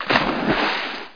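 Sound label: WTR SPLS, water splash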